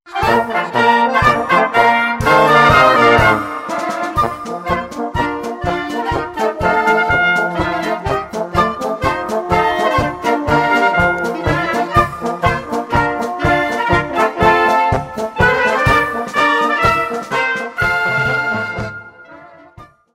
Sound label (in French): Marsch, France - Alsace